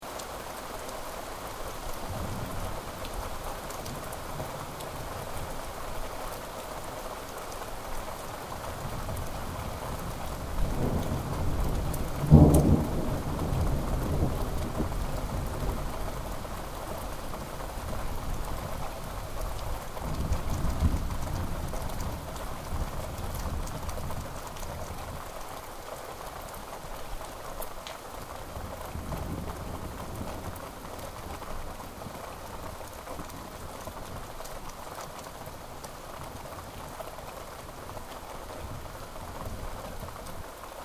Ambience Sannohe Thunder Storm, Japanese weather Ambience; Loop Stereo; Outdoors